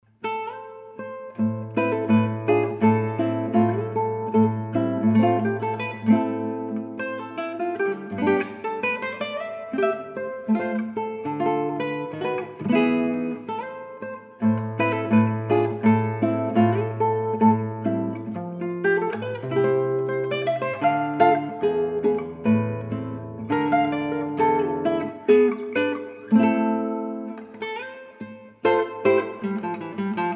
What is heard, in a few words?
Gavotta